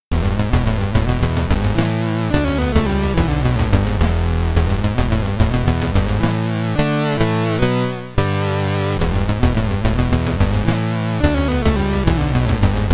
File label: SID Stereo; Alderan; Commodore 64